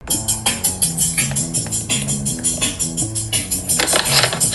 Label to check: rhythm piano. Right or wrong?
Right